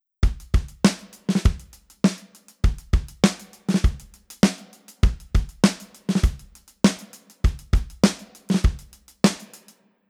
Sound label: drum all